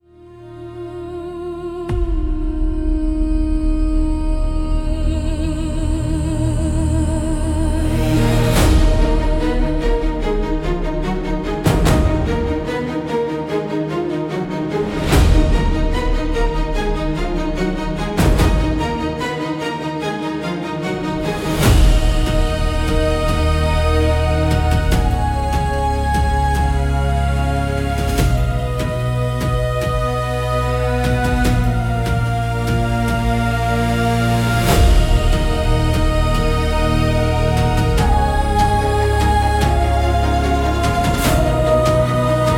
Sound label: Soundtracks Orchestra of The Mystic Night; Tuneify; cinematic soundtrack pack